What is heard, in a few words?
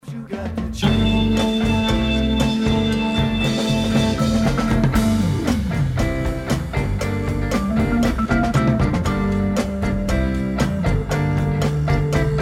organ solo